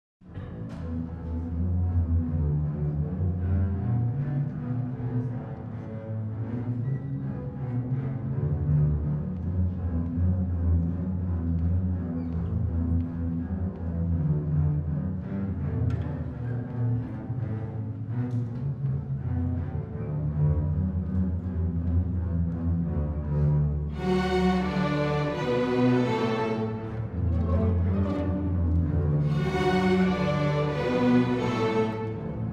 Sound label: CAN CAN BASSES; PMEA DISTRICT 1 ELEMENTARY STRINGFEST 2023; J. Offenbach